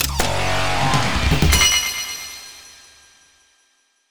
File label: Quest Success Mine; Bust n Rush